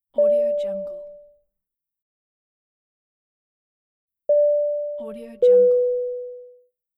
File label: airplane call button